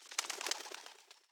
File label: Pigeon Fly Away